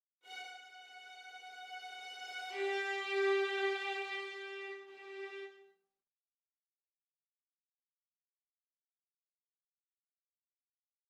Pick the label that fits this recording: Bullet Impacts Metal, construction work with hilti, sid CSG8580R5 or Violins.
Violins